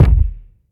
short reverb kick sound e key; kick drum samples

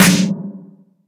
noisy snare one shot f sharp key

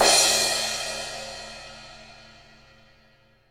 ambient cymbal sound f key

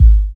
fat kick drum single hit G sharp key

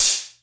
clear hi hat closed sound A key

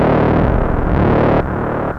monodelay A sharp - 131, synth loops

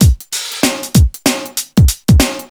96 bpm breakbeat c sharp key; sample

